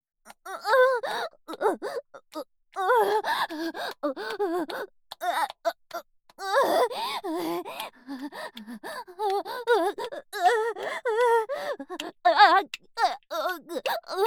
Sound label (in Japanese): オホ声; SEあり